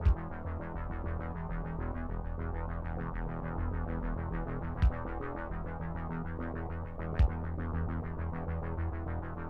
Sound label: Wave attack; Plants vs. Zombies Battle for Neighborville